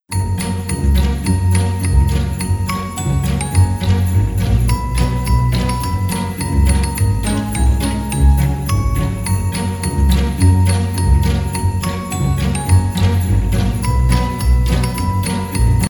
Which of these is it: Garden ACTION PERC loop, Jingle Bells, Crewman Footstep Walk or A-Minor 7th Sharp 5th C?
Jingle Bells